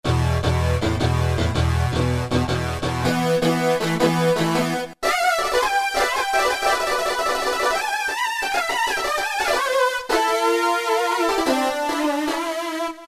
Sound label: Heavy Metal Lead, Roland PN-D50-03